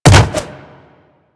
Jump Attack Default; Wall Run; Tenno

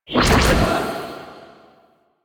Rift Exit; Warframe; Magician

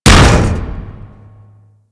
Hammer Impact Default D; Warframe; Weapons

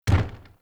Wood Stool Impact Light

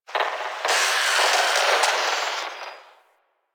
Landscape Construction Site One Off; One Offs; Warframe; Venus Open World

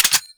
Glass Shotgun Mech; Warframe; Tenno; Weapons

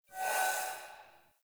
Inorganic Pickup; Objects; Pickups

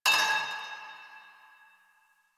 Pipe Cement Drop; Warframe; Ambience; Nineteen Ninety Nine

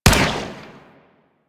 Arch Burst Gun Shot, Weapons; DE; Warframe; Archwing

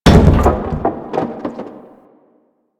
Debris Metal Wood; Warframe; Ambience; Entrati

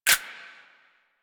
Heavy Weapon A Clip In; Warframe; Heavy Weapons

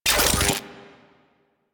Charge Up, Spear Gun; BE Ferrox

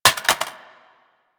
Reload Drop Mag Default, Weapons; Warframe; Boar

